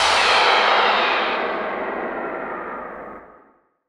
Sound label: NOISE, Production Effects